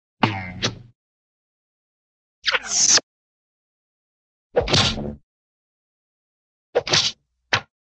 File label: arrow, cartoon bung zip bow